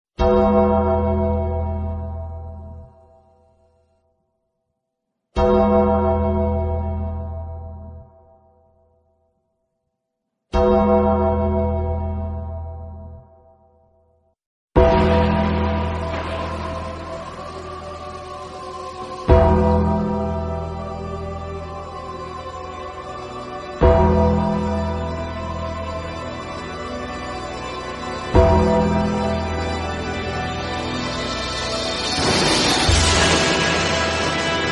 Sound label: piano, theme; the undertaker